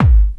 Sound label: KICK hardcore, Techno Trance DRUMS